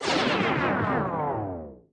Fail Vinyl Scratch; Subway Surfers City; Android, iOS; Trials Run Fail Vinyl Scratch

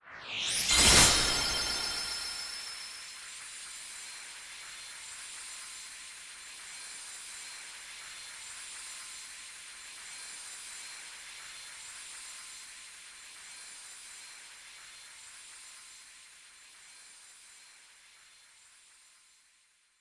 Gacha Intro Normal Loop

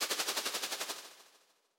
Korokoro; Pokémon Friends; Android

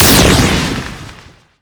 Crusher Shoot

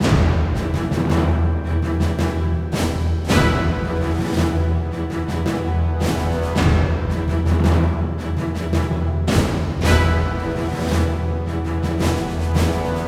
Boss combat; The Hobbit; PS2

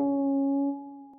bg epiano c#4; gamerip; lofi room demo

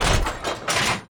Enemy Ferriswheel Active Move; disney star smash android ios mobile gamerip 2020

